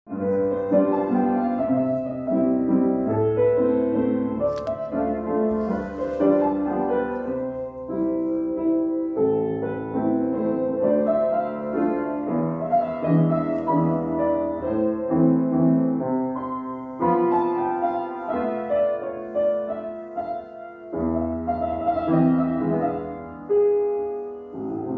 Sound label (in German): klavier